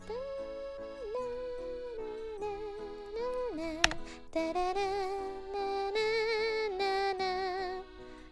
Singing; MC stream bgm; IRySinging

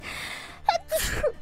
Sneeze

